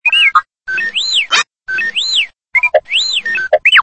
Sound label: voice, R2-D2; Enhanced R2-D2 to Be Controlled by an Android App